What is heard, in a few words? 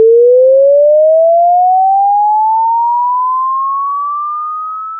chirp